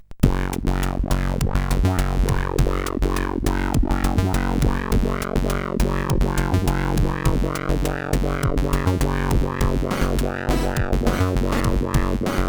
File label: Bus Noise intro, CSG8580R5